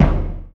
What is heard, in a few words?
kicks